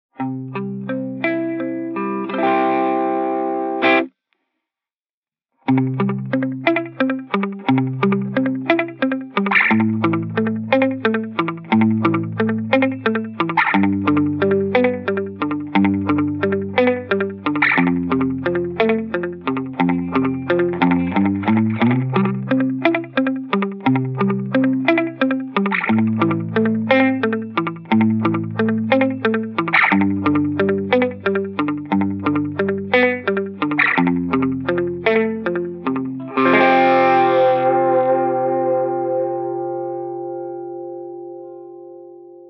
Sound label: Lofi; echosystem